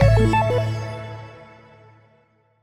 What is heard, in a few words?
Menu FX Organic - A6(Sus2), Video Game; Cymatics; Video Game Sample Pack Vol 1